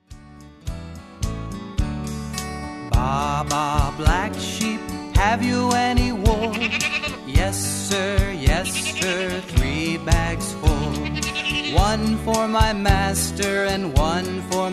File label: childrens favorite songs karaoke vocal nursery rhyme medley; disney